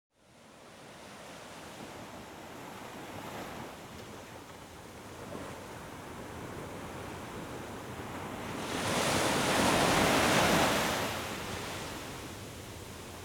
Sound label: Niterain Ocean, Healing Music with Nature Sounds